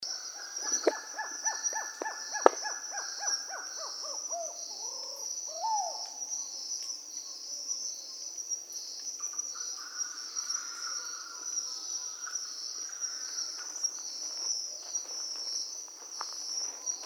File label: white browed hawk owl